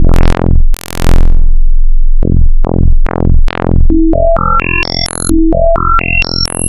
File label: Classic Square Filtered, wavetables; Architecture 32